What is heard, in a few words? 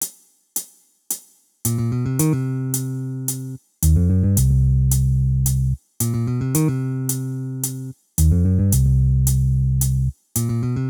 bass